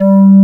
SYNTHESIZER ANA LEAD; AKAI S6000 CD-ROM - Volume 1

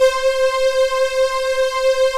LUSH STRING; Pro-Rec - Synthsonic; Z1 PADS

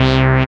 SYN 202, MC-202; Sound & Vision - Gigapack I CD 2

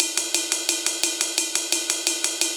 D&B Percussion Loop; neuro

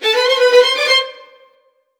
STRING LICKS, Disco; Best Service ProSamples vol.48 - Disco Fever; AKAI

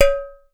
UTAR, Definitive Percussion

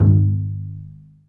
Bass Piz E G#0; Digital Sound Factory Virtuoso

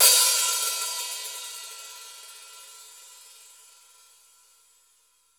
CYM 14, Real HiHats, 14 Hi Hat

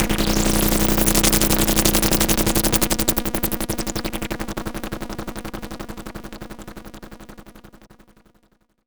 Dripping Cream; Zero-G; Soundclash Nu Dance Electro; Acidized Wavs; FX Atmospheres

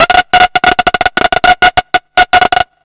NUCLEAR, Hard & Loud Techno; USB Soundscan vol.01; AKAI

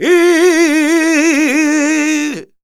voc d#, Flamenco Vocals Male Cantaor; Male Cantaor 2

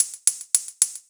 NRG Cymbal; Sonic Foundry (Sony Creative Software); Trance NRG VOL-1

